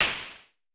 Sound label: SN Low Punch, Vermona Mono15 Drums Snare